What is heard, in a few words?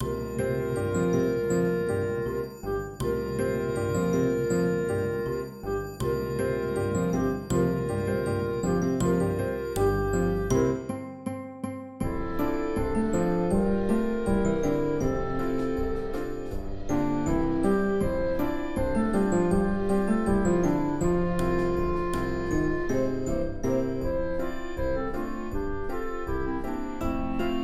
orchestra